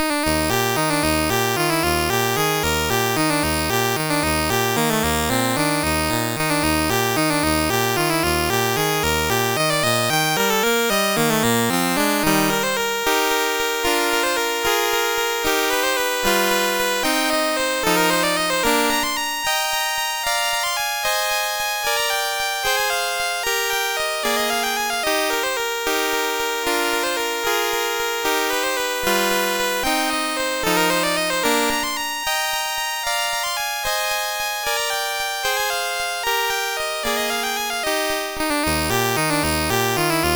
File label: Waltz, MOS6581R3; Joseph A Grau